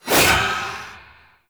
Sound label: Void Heavy Scythe Alt Whoosh; Warframe; Duviri; Incarnon Adapters; Melee